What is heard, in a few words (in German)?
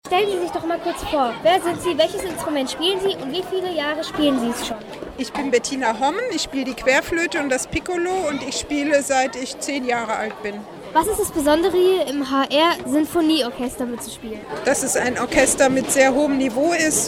Querfloete